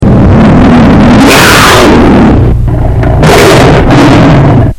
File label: tiger